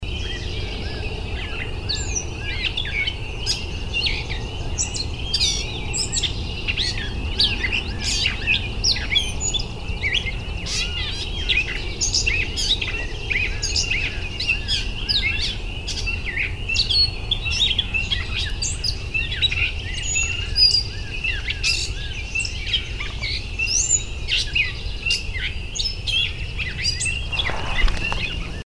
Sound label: Gray Catbird, Garrett; Finzel Garrett